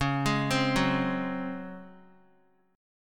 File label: Db Major 7th Suspended 4th, Guitar